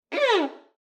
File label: Violin Slide Down